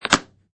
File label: Suitcase Unlock